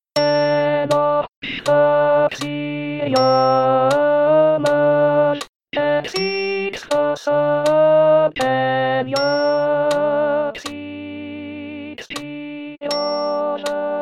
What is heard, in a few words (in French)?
Voix Alto; Psaume 103